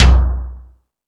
More Toms, Drum Machines

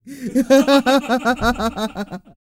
LAUGHS; Spectrasonics; Vocal Planet; Jazz FX

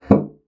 bassoon slap; Xsample VOL-6; C BASSOON

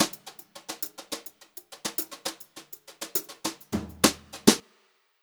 FILL, Brushed Drum Loops; USB Soundscan vol.39; AKAI

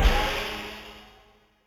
Orch Smsh; drum machine

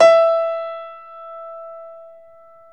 PNO Grand Pno; Club-50 - Foundations Roland

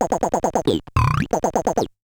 Bent Talking Teacher, Circuit Bent

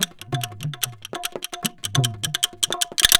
DHOLAK, Indian Traditions; USB Soundscan vol.45 - Indian Traditions; AKAI

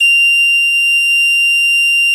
F7, garklein; SoundBytes - Early Patches

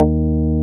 PLOP D#2, BASSES

